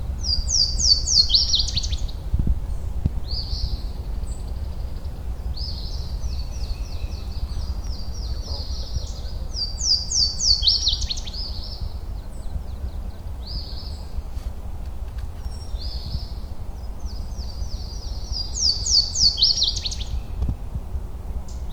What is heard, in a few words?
Louisiana Waterthrush; Belleplain SF NJ